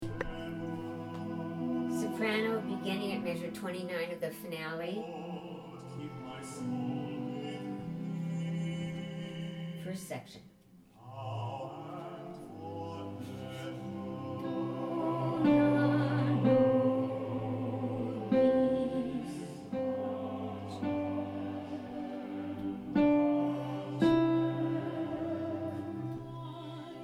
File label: finale sop. beginning